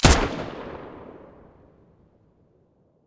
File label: hk416 muzzle stereo